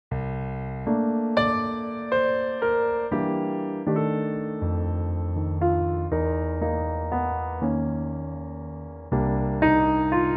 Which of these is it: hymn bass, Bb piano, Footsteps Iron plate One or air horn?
Bb piano